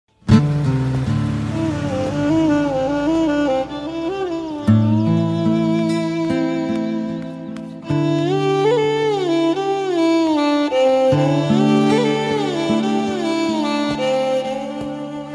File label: sad bgm music telugu bgm; vikramarkudu; raviteja; anushkashetty